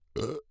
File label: burp; OPENAIR; Anechoic